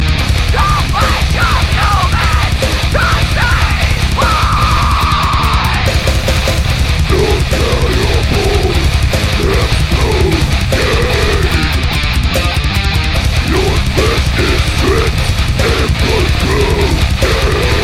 hard metal

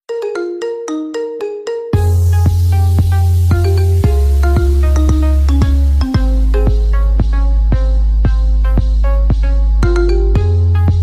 marimba remix

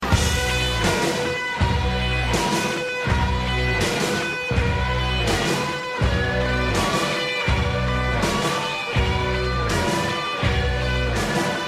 hard rock intro

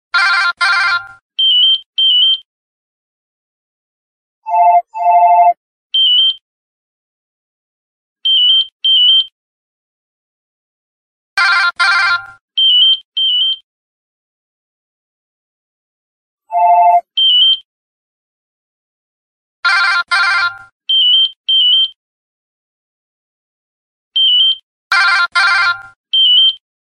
office ring; htc

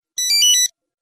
old tone; nokia; ringtone

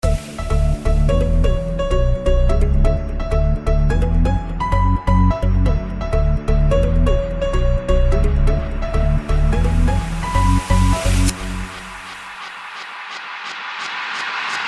piano dance

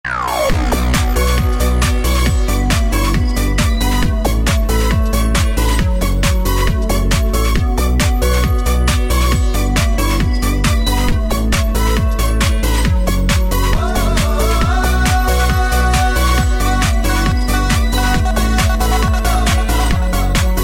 dance tune

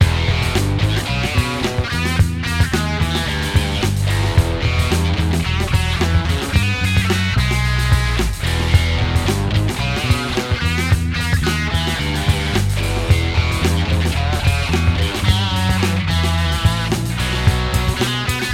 swamp salsa

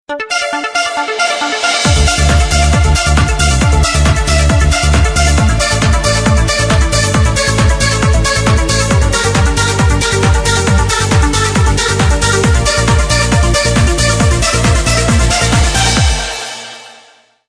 best beat

